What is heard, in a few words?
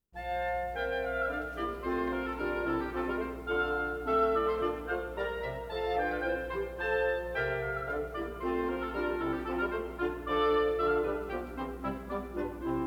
Water Music Suite; Handel; Munch